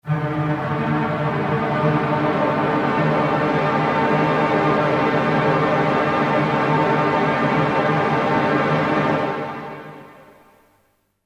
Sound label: tremolo